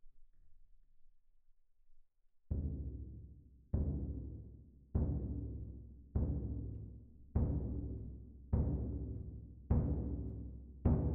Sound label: Perc